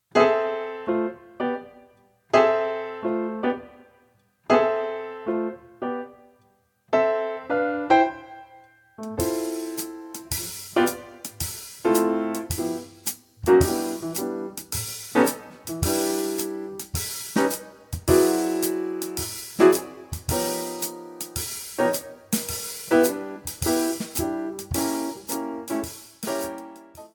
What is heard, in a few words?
Pno Dr